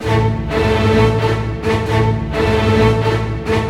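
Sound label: 136 war, orchestra; East West; Scoring Tools; War Status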